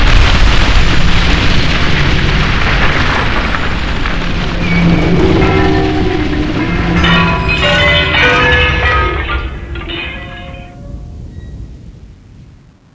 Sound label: gastower collapse; zs rendezvous